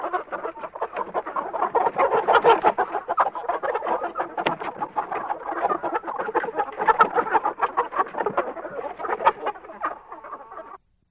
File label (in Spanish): gallina; animals